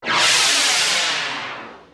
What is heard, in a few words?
WIND; Office; MSOfiice95RUS